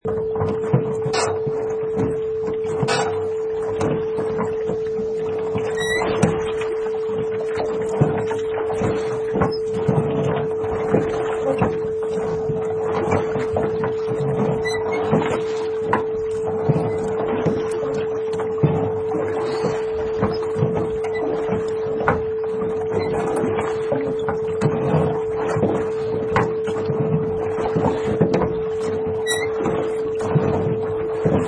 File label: Rowing